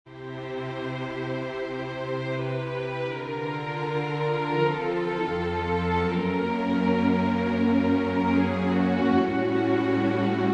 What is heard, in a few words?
string quartet; bruckner joseph anton; perger prnoludium major